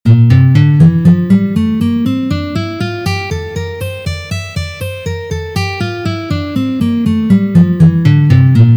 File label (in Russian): Gamma Fa mazhor Tri noty 6 pozitsiya